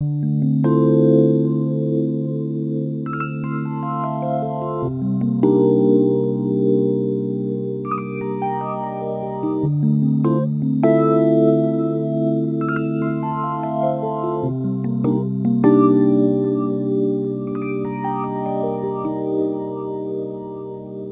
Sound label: Rhodes Attacks; Ueberschall